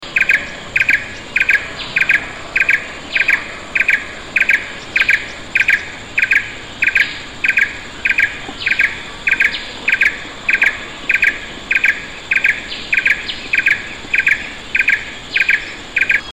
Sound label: blue-eared barbet